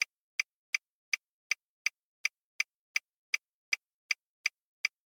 car blinker

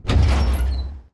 iron door open

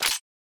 katana storage

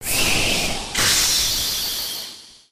magic ice

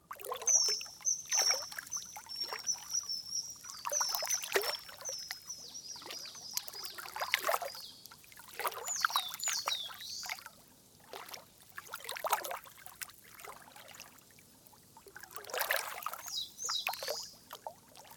pond

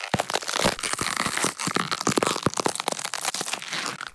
transform monster

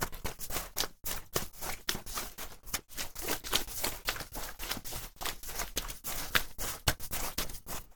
dash snow

